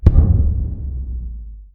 hit; Apotheorasis

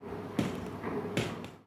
Training Fail Ambi; Pitch Black - A Detective's Demise

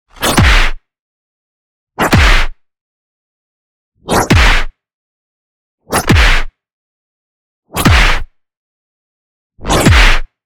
PUNCH Power Punch; boom library; Anime Essentials

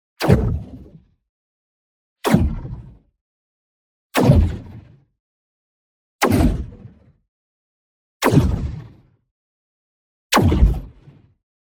IMPACT SMALL Magic Projectile; boom library; Matter

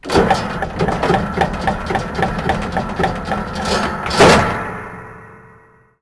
Bleachers Metal Open; Doors

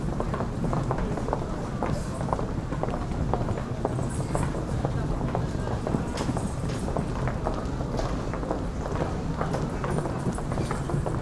Urban people footsteps babble; urban and suburban; boom library

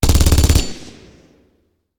Machine Gun; Sound Ideas; Guns

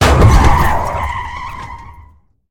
hit car; Blind Drive

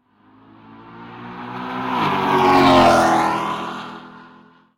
car; Blind Drive

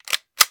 Gun, Machine, Release Charge; gun and weapon sounds; captain audio; fully loaded

